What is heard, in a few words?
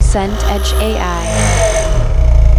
engine rev